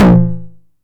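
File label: 909 high tom tape saturation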